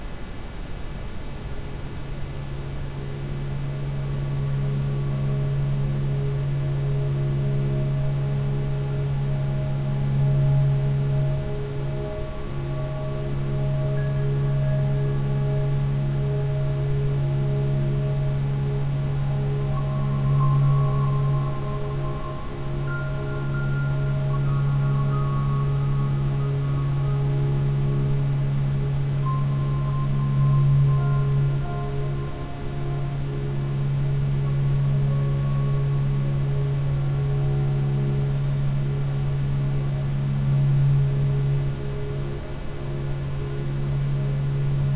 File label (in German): Rife Sakral Funke mit Ambient Sound; rife frequenzen